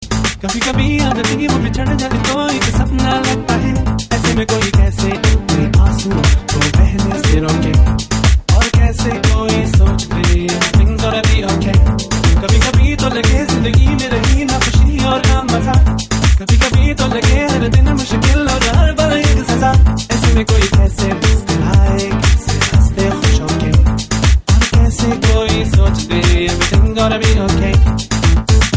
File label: Smooth Groove Mix, Hindi Remix Tones; Hindi Movie